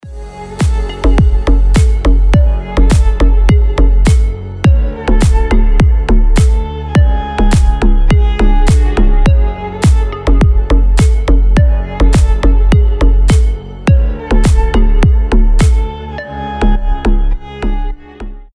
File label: deep violin; скрипка